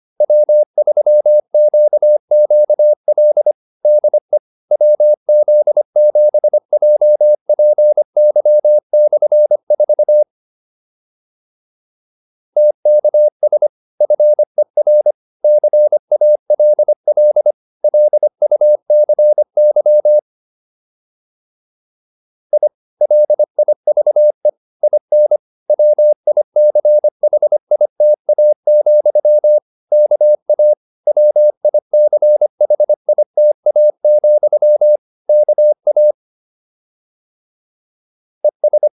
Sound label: QSO, advanced practice files